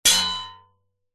warfare sword strike metal could be shield vibration; zapsplat